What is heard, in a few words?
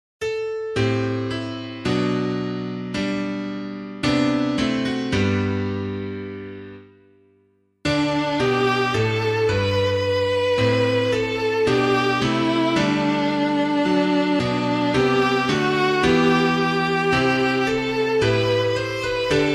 piano